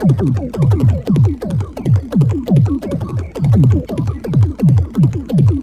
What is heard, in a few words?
170, Drum Loops, Drum & Bass